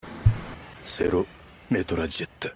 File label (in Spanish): metralleta